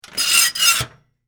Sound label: Iron Board Fold, Household, Metal, Squeak; Laundry; BlastWave